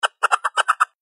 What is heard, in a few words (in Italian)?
rumore